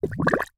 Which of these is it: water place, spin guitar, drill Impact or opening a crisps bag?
water place